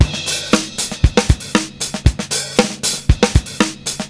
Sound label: 117 bpm, breaks; Zero-G Beneath the Planet of the Breaks